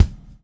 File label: BUBL, BUBBLE; Spectrasonics - Backbeat